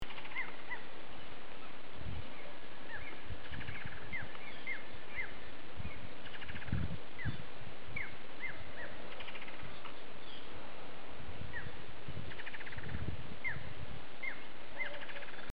plumbeous forest falcon

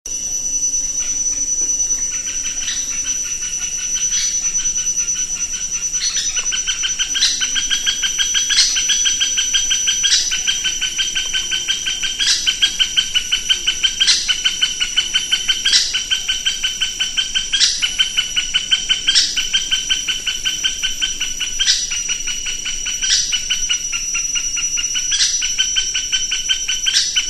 orange backed woodpecker

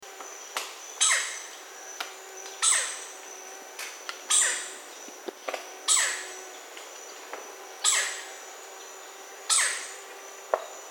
red necked woodpecker

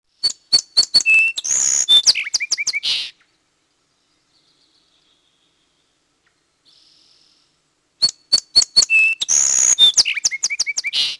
song sparrow

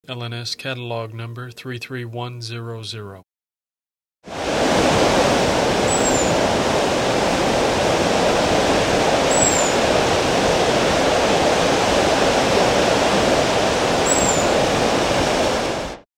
sulawesi kingfisher

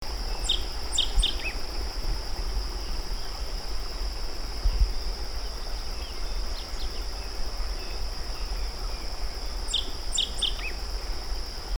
rusty sparrow

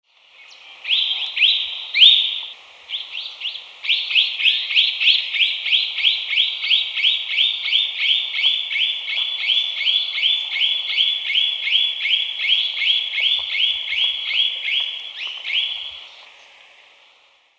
grey headed parakeet

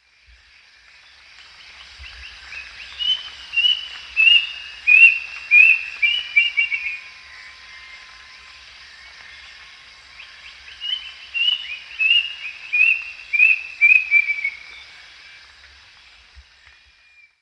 plaintive cuckoo

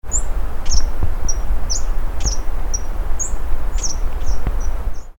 green and white hummingbird

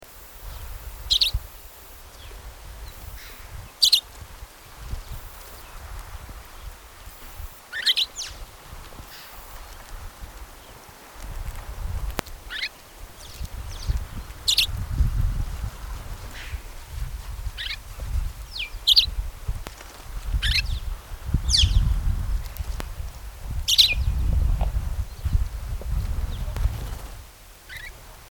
crimson winged finch

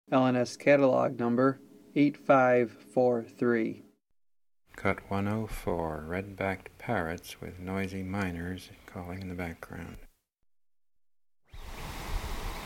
red rumped parrot

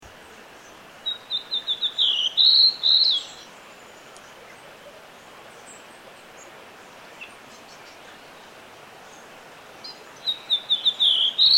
rose robin

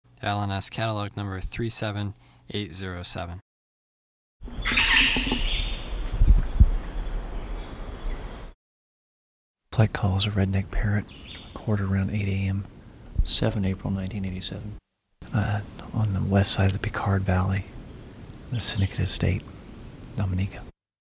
red necked parrot

